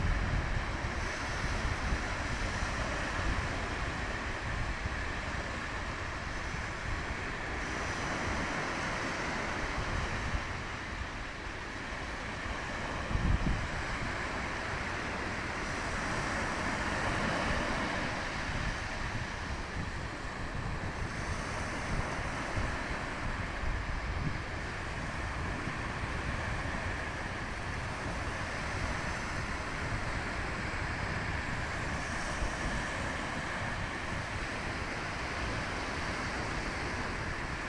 druridge bay seashore

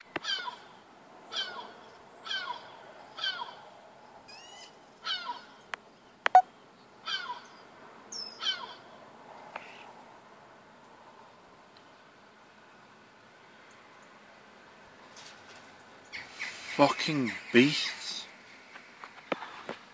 the sound of evil birds on our street

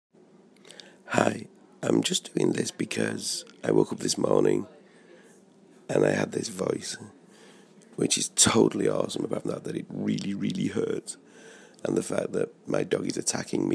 morning throat

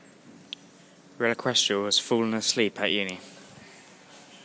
asleep

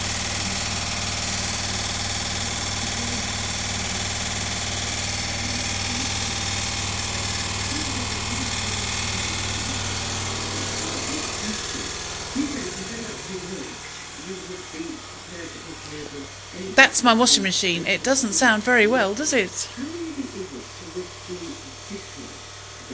washing machine

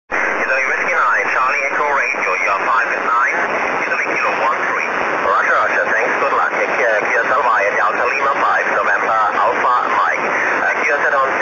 6m ssb

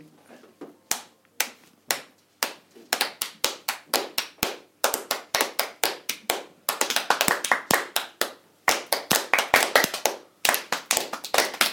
clapping piece

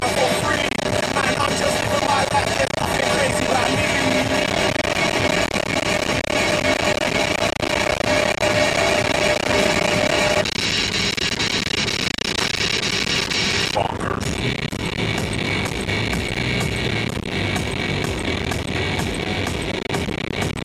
a minute of manic commuting ambience

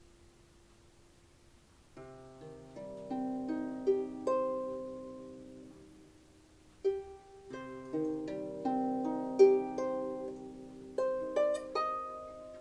harp playing

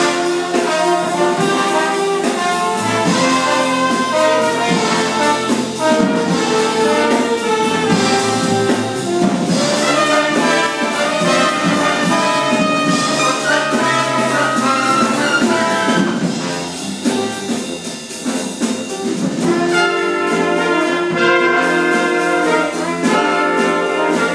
big band in rehearsal; jazz on sundays at hannahs

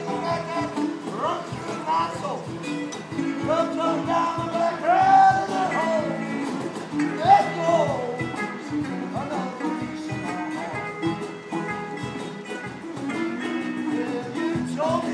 singing the blues outside the office

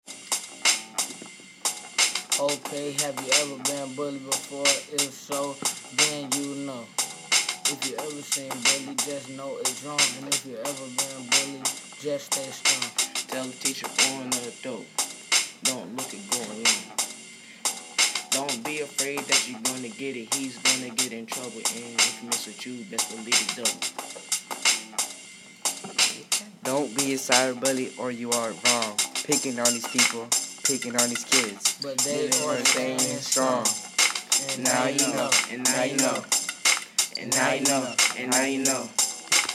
rap

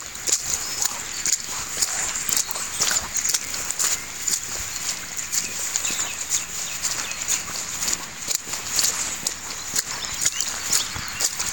muddy puddles and squelchy grass

